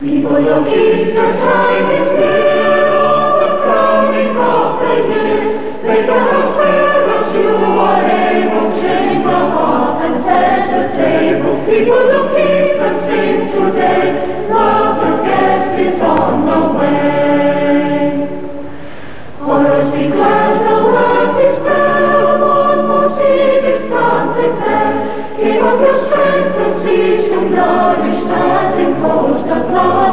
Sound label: choir